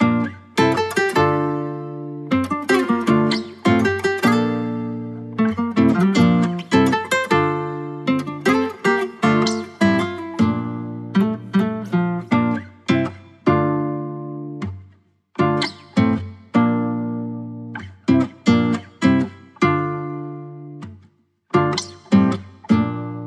Journey Guitar Loops, 156 BPM D Min; Up For Grabs; Cymatics

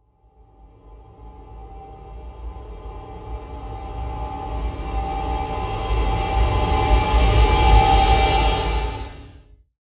Riser

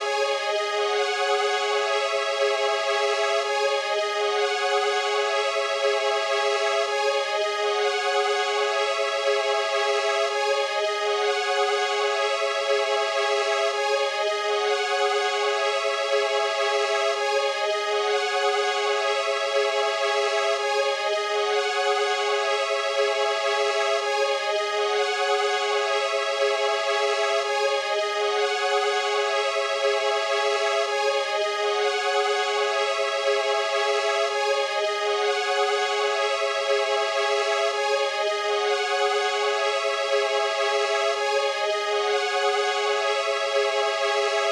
140 BPM C# Min Strings, Drill; Loop Stems; Cymatics; Too Tough; 2022 Melody Collection

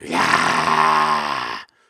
Grunts and Chants, Viking Vocal

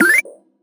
Retro FX; Video Game; Cymatics; Video Game Sample Pack Vol 1

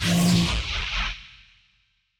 Spaceship FX; Video Game; Cymatics; Video Game Sample Pack Vol 1

